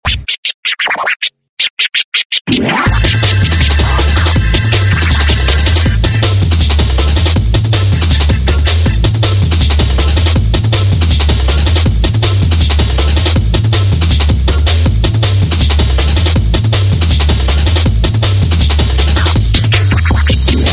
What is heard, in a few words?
Drum n bass; Drumnbasss